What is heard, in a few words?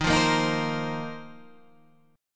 A sharp Suspended 2nd Suspended 4th D sharp